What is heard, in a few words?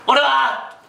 teleport; freak fortress 2